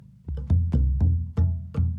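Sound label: Calm Far, music; from dust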